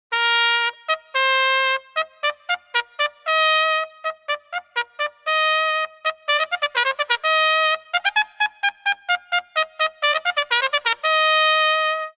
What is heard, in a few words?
trumpet